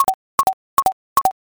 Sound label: fs geta